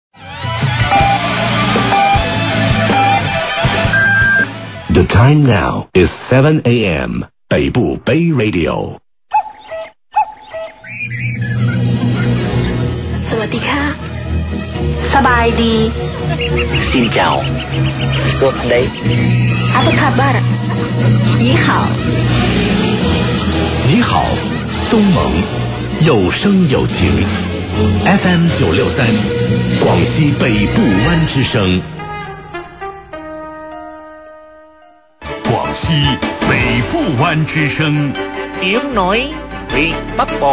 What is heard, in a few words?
interval signal; guangxi pbs bbr